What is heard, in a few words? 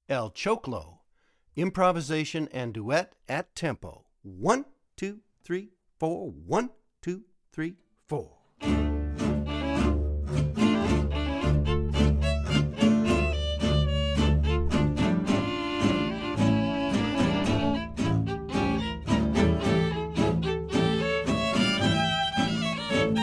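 El Choclo improv and duet reg, Fiddle Swing; El Choclo, improv & duet at tempo; Jeremy Cohen's Make Your Fiddle Sing! Park II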